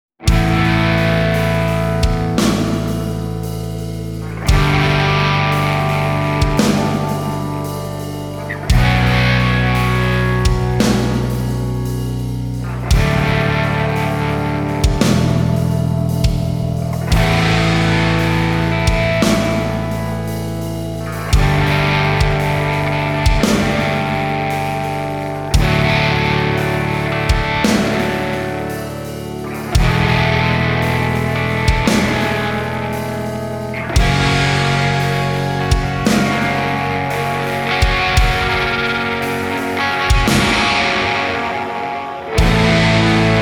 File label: Chill Emotive slightly distorted all but solo guitar; CUES for film TV games etc